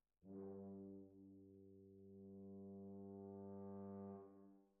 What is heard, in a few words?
Horns